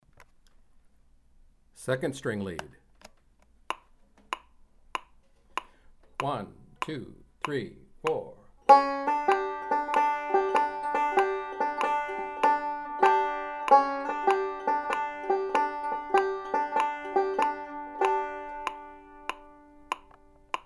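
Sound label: 2nd string lead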